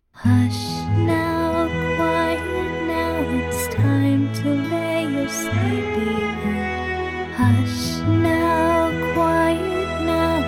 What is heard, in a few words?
Lullaby, Orchestral